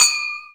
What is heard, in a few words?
FING CYM, FINGER CYMBL; AKAI S-Series CD-ROM Sound Library VOL-5